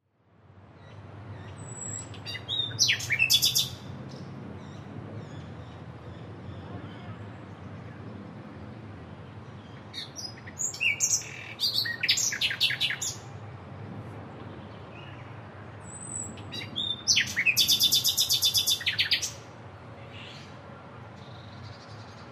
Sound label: Bird In Park Ambience; birds